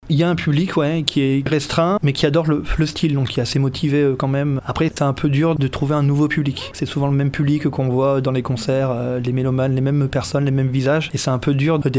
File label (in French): Concert Jazz